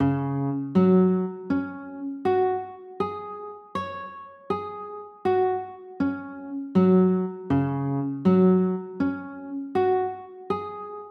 c sharp sus4, chords

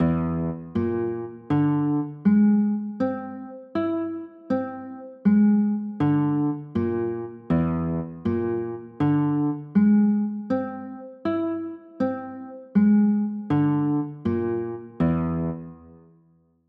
b flat 9b5, chords

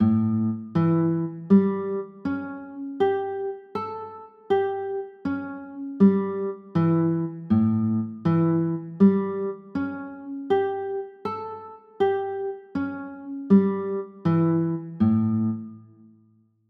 a 6, chords

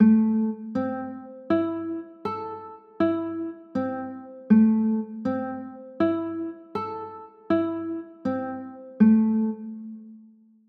a m, chords

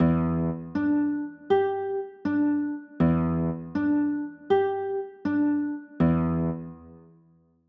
e 7 sharp 9, chords

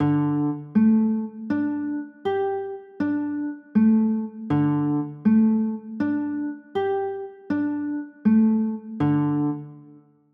d sus4, chords

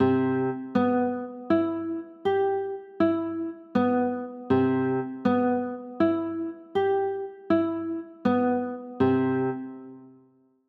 c maj7

